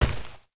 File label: Jewel Tree Walk; Seer; GamigoNA